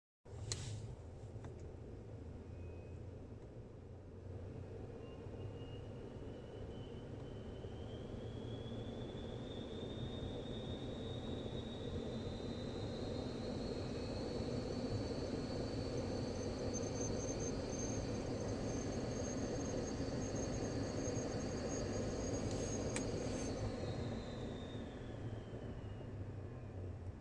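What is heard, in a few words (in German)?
Pfeifen Lüftung Prius 4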